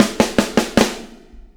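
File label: REVEREND FILL left, drums; Spectrasonics; Backbeat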